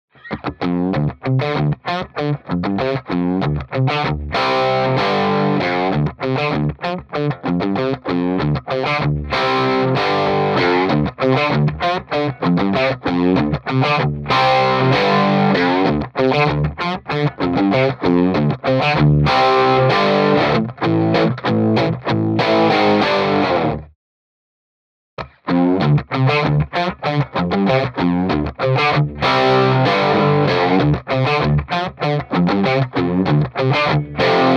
Sidewinder - mid cut; mojave

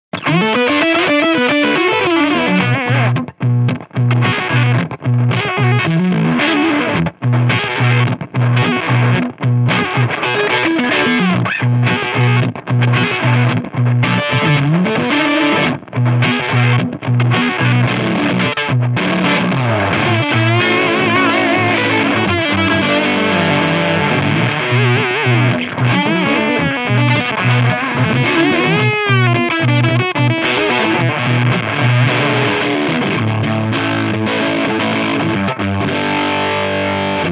fuzzy mosquito fuzz, pedals

